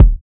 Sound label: GLASS; Spectrasonics; Metamorphosis; GLASSBABY